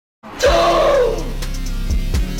huhhhhhh

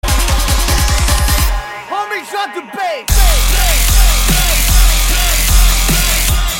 drop the bass

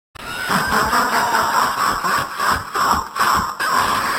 mystery laugh

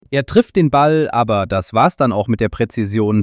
pass fail

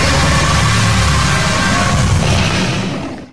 jumpscare; one night at potatoes 3

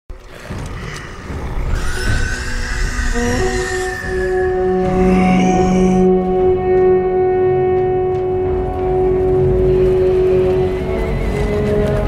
horn, riders horn; rohirrim

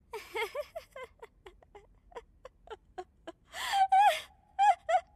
crazy laughter; pomni

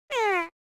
om nom sad

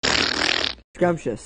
scrumptious fart

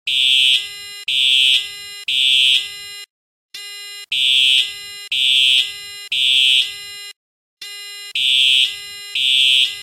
fire alarm; plex

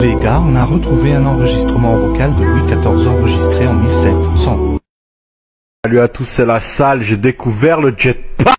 louis xvi vocal

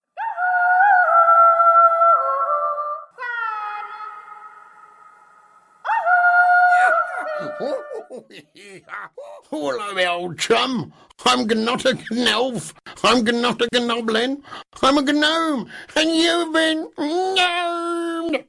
herdcalling, ancient swedish herdcall

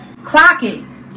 clock

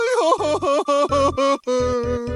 cry

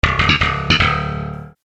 sitcom transition bass riff; seinfeld

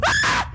flamingo scream

